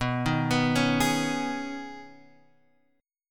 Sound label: Eb 7th Suspended 4th Sharp 5th